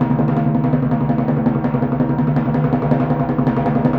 Timpani, Orchestral, Rock Pop

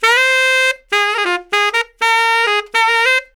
SAX LICK, BRASS LICKS; Ueberschall; Jam Box

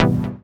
chord one shot channel A, Classic Chicago House; Sample Magic; Chord Shots

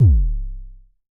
Mild Thump Kicks C1, Vermona Kick Lancet; LookIMadeAThing Kicks; Mild Thump Kick - Precise

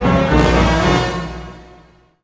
GRO, Orchestral Loops; 128 COAST ROAD

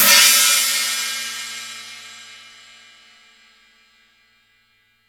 CYM, Real Drum Kit, SWING KIT; Best Service ProSamples vol.43 - Real Drum Kits

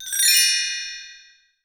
CHINESE, BELLS CHIMES

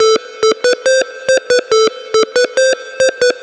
140BPM A; Vengeance - Essential Clubsounds Vol.4

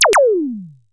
Disco Zappa, sci-fi

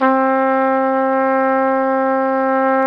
Flugelhorn; Roland LCDP12 Solo Brass

